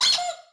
squeak duck; World of Tanks Blitz; ambient milbase

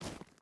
footstep hide gravel walk; Rust

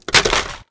fence light wood; World of Tanks Blitz

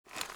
foley usp reload lift; Call of Duty Modern Warfare

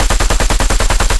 loop dt origin; guns tanks

